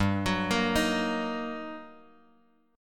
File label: G Minor Major 7th Sharp 5th, down, Bass Standard; GmM7#5 arpeggiated; JGuitar